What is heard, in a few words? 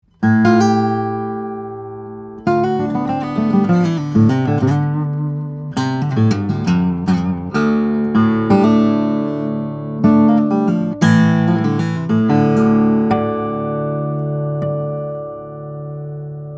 Martin 00-40H, steel string guitars, flattop; 1930; brazilian rosewood adirondack spruce